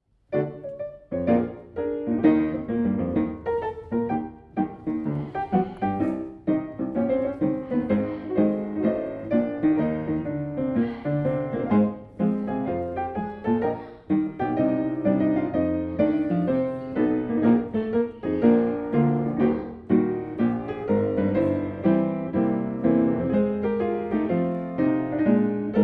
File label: Grand Battement, ballet; mus4ballet